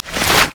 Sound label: basicattack draw; LoL; varus